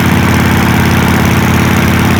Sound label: rpm, sled; WhiteOut